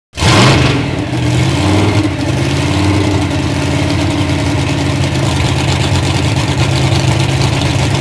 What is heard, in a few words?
START IDL; mtm2demo; PC Gamer 4.2